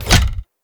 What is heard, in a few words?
Bow Headshot Hit; Weapon Impacts; Player; Sweeteners